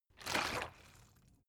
bottle water sparse upend soft; berufe

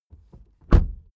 suv close door soft

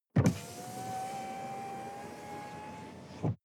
sports car int window open; verkehr transport; autos

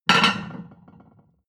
plate on plate place hard, restaurant cafe

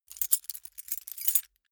key ring mid size rattle; objekte; schluessel

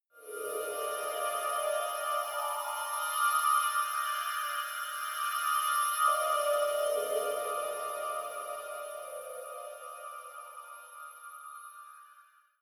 space chiming horrific wind; weltraum

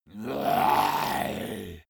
monster growl throaty; kreaturen

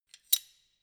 wrench open; schrauben drehen hydraulik

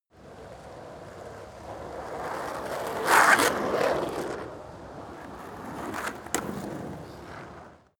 skateboard single drive by scratch; sport freizeit

